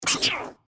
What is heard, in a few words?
giant rat attack; npc